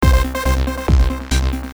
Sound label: Technoloop; Digitnoise